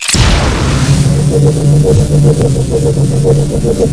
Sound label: wind up, weapons